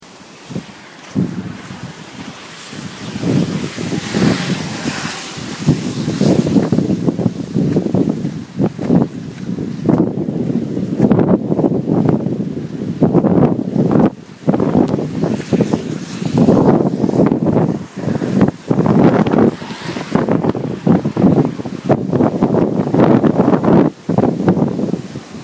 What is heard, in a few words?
Electrified Environment